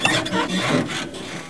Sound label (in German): saege